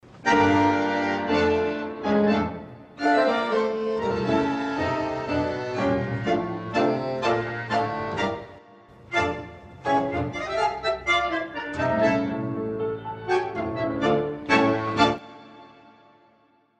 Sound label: Tango Argentino